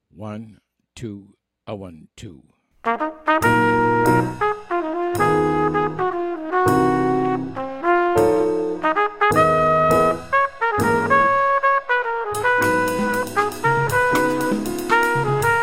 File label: minus bass, composition, with lots of question-and-answer activity and interplay; count off sets up the melody